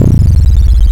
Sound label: Long Thud; Joust